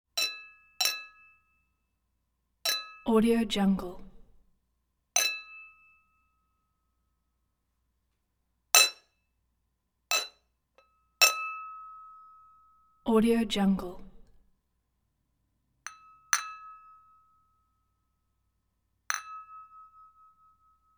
teacup china or ceramic cup bell like ring

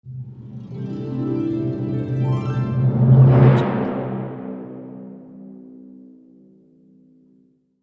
triumphant orchestral ident

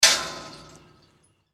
basketball hit rim; hollywoodedge

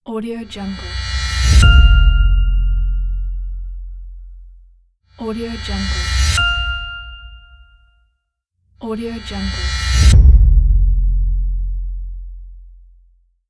short epic reveal

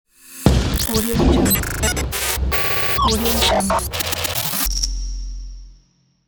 digital glitch intro logo

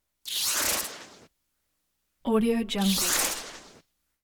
fluttery static pass by

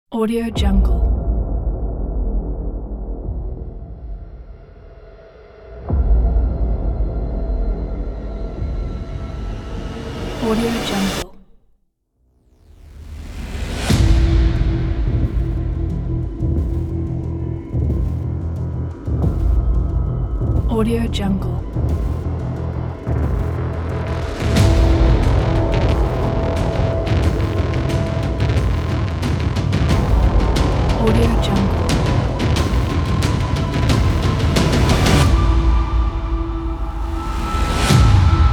action trailer

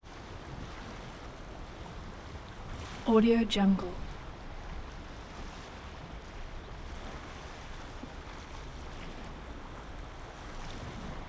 water lap small waves

